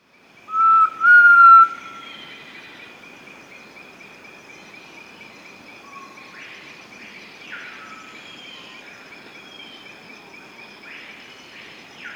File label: Elusive Antpitta, Grallaria eludens, Nature's Songs, S. America